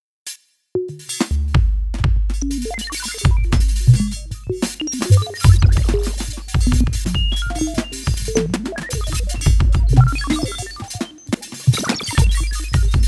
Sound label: free jazz